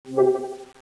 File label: trap; cstrike